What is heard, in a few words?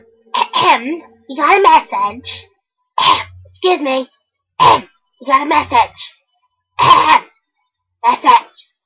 cough message; ringtone